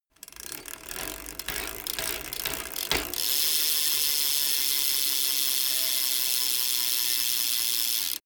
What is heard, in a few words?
bmx bike pedal accelerate freewheel stop